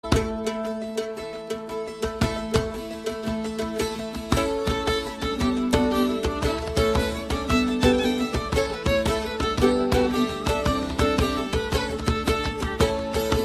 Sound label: Jigs